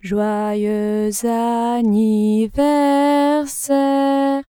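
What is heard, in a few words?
female anniversaire isochrone transformed, French song, female singer; random speech generation; CLEESE; PhaseVocoder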